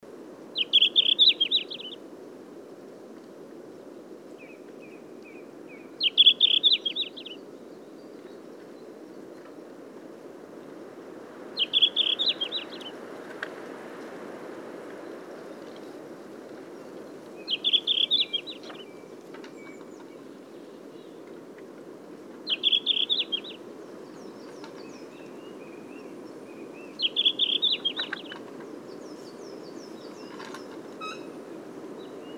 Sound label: Tarsiger cyanurus song; passeriformes; muscicapidae